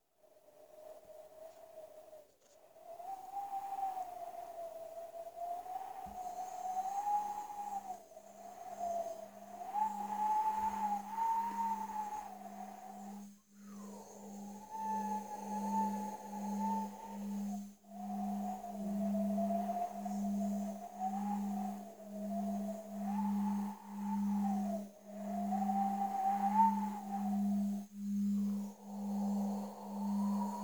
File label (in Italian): meditazione con campane